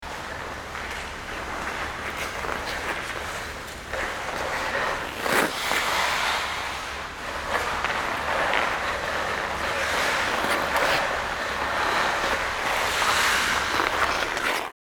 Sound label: Ice hockey arena ambience skating no crowd